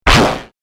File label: Laser Zap, Laser Gun